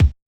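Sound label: Bass Drum HipHop; Yamaha DJX-2B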